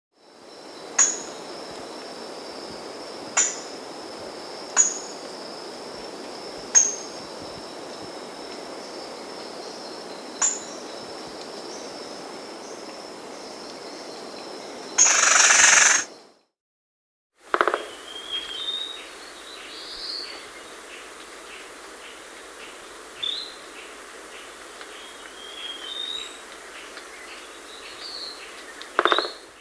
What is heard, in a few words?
Crimson-Bellied Woodpecker, Nature's Songs, S. America